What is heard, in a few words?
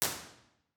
theatre bformat IR; Individual IR Takes; OPENAIR